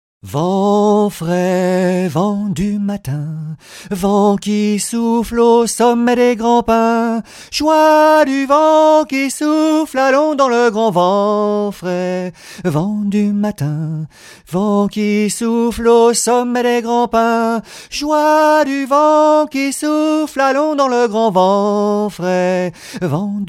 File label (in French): comptine chant vent frais